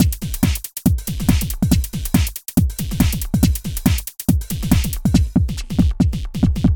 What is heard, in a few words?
Electro Swing 140